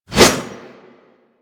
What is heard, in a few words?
Pole Arm Whoosh; Warframe